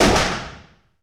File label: Pistol Shot 9mm Semi-Auto; Weapons & Firearms